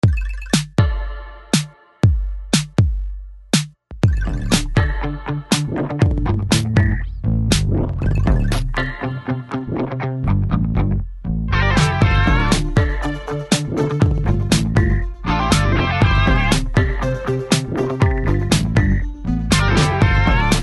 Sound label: rhythm blues